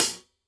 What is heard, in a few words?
HIHAT 14 closed tip less tight